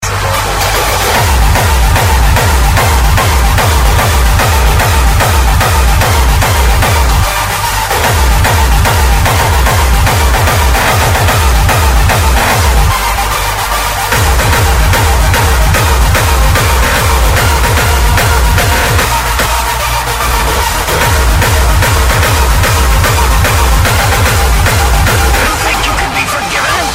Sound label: Hardstyle